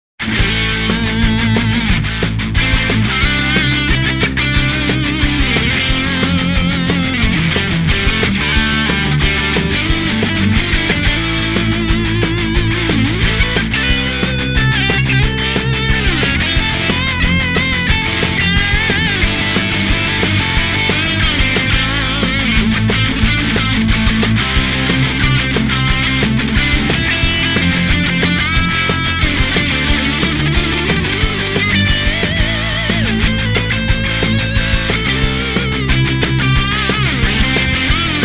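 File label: Rock Jam in Am with solo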